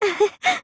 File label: giggle; sayaka